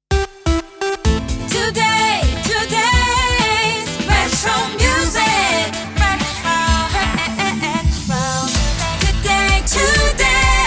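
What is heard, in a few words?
Sung Jingles Radio Jingle A minor 128bpm Slogan; Deja Vu Radio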